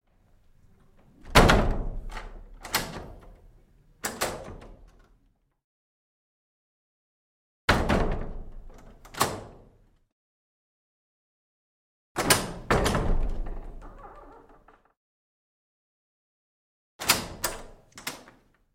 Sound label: Wooden Vestry Door With Latc; Hollywood Edge Historical Series; cd4 Castles Palaces & Monasteries